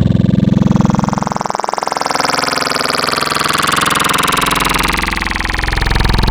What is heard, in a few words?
Growl Tables; Xform; Cymatics; SERUM; Growl Tables Vol 3